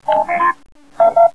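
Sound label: comp sound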